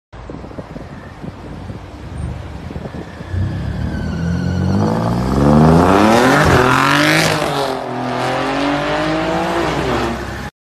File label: Alfa Romeo exhaust